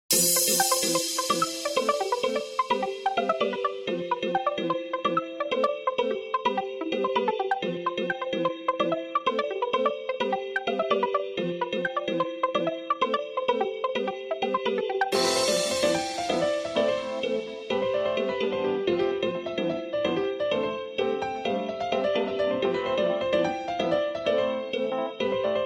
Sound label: floating